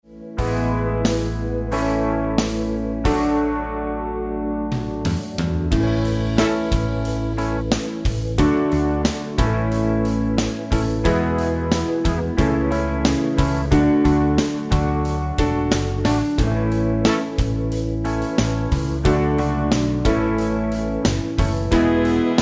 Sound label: Country Soul Improvise With A Pentatonic Major Scale, guitar